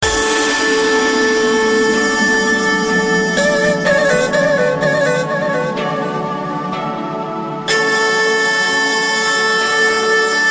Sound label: Intro Mobil Tone Mix